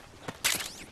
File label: ammopickup; metal gear solid 4